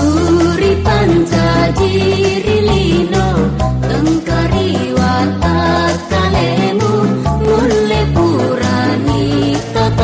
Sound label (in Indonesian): lagu lama indonesian nada dering